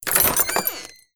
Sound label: Open Chest